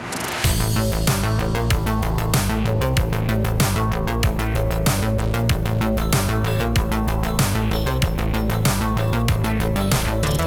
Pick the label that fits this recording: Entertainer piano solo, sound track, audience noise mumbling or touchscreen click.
sound track